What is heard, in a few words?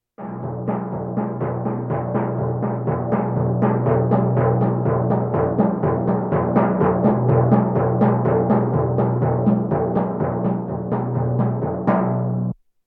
Percussion Bongos Tympani rolls Gongs Drums